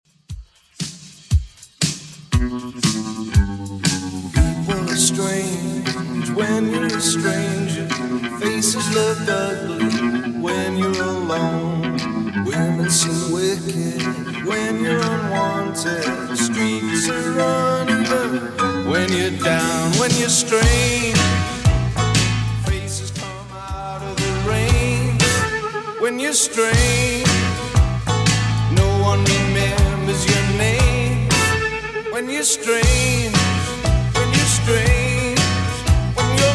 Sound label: 80s Redrum 118 bpm